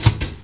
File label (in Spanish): interruptor